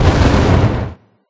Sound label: Fire; Life of a Naomi